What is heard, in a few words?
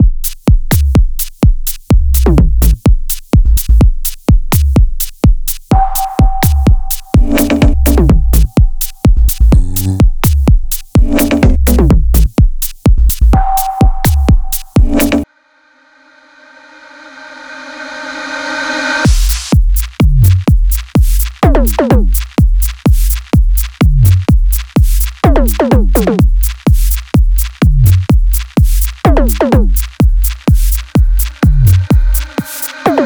unusual minimal